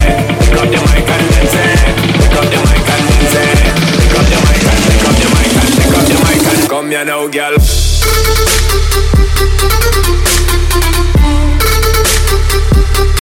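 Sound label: dubstep ringtone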